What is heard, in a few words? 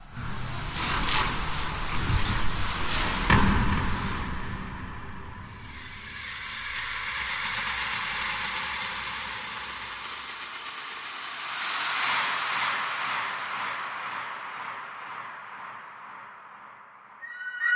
Catacomb Reverb Wet; Echo Chamber; Echo Chamber is a stereo reverb and delay plug-in; Syntheway